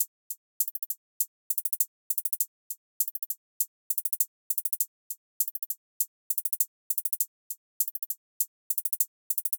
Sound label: Drum Loop 100 BPM Hihat; Cymatics; Vibes II Drum Loop Collection; Eclipse Drum Loop